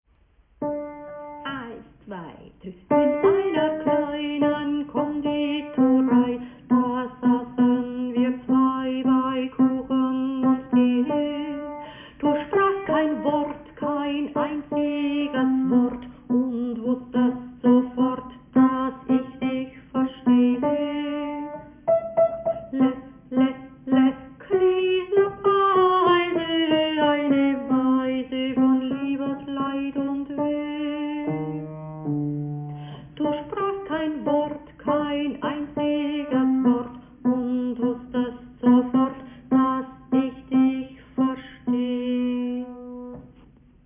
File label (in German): Tenor hoch; Konditorei